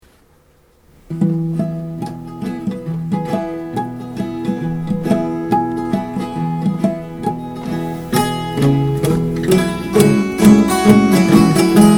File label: Instrumental